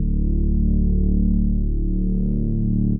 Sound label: Reese D; 99Sounds; Monosounds; Music Loops; Instrument Oneshots